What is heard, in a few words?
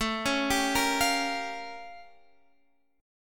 A Augmented 7th Flat 9th A; arpeggiated; 1,3,sharp5,b7,b9; x,12,11,12,11,13; JGuitar